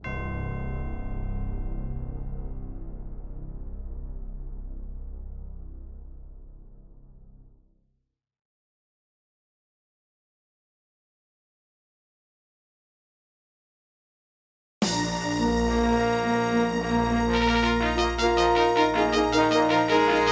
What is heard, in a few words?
Intro, Yamaha MU5; Dark Forces